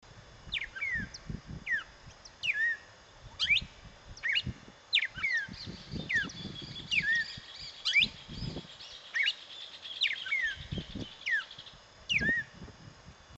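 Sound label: Cardenal, Paroaria coronata, Red-crested Cardinal; cantos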